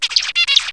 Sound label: samba